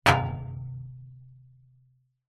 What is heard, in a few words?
impacts metal road barrier knocks hand